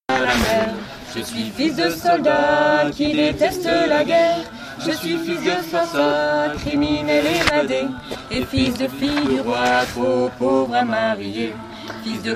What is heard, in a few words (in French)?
Je suis fils de ALTI 1er couplet haut et bas